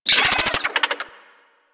Infested Minion Pain; Warframe

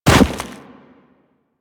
Run Deep Snow, Footsteps; Warframe; Operator

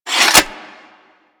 Hammer Sheath A, Foley; Warframe; Weapons

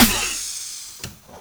Car Tire Pop; Nineteen Ninety Nine

